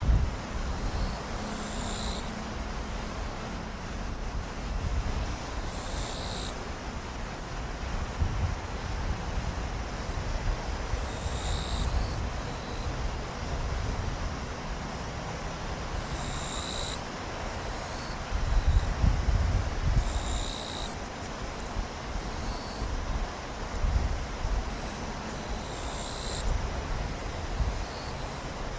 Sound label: insect